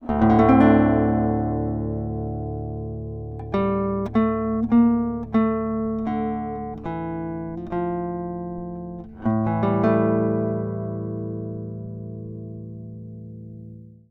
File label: Positioning Examples Stereo